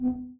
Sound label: txting type numbers; Steam; tenfoot